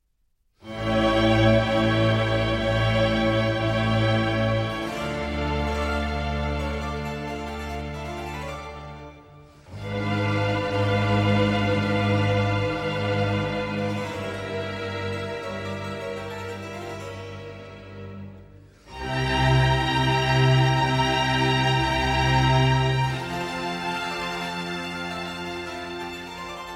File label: Concerto for 2 Trumpets, Strings & Continuo in C Major, Largo